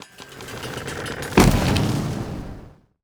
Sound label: onager attack